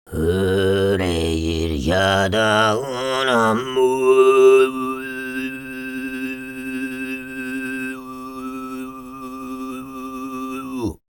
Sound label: SOLO, TUVAN RASPY, World Voices; Spectrasonics Vocal Planet CD5